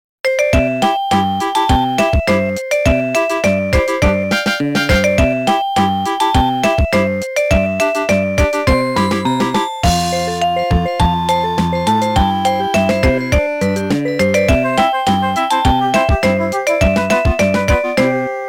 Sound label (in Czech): melodie